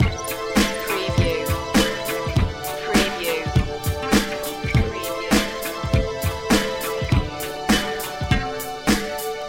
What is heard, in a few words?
easytronica